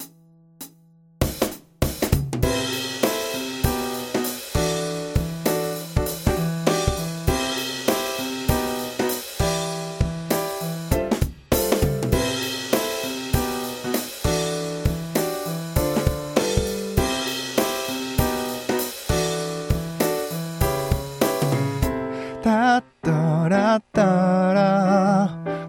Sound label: song 8beat